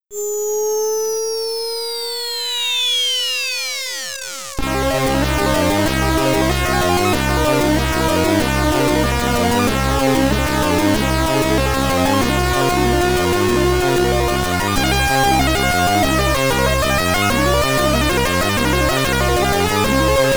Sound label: In-Game BGM (SID Stereo), commodore 64; Scumball